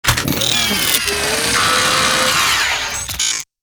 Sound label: Robot power down shutdown; robotic